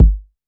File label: kick, electronic